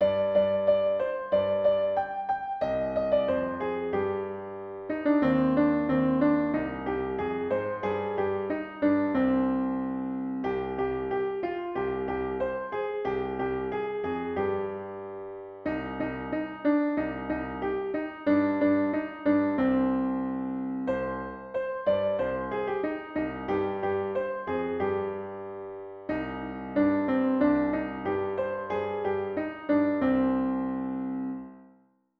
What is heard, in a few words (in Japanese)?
うれしいひなまつり（ピアノ）